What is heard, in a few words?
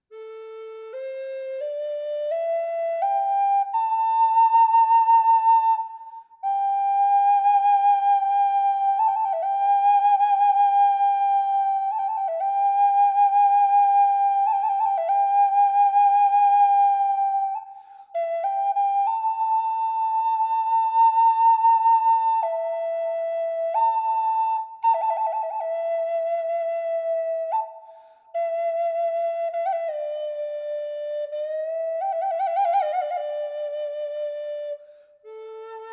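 reverb, flute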